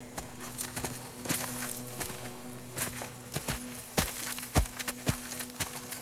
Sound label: group footsteps crunching on dry grass; foley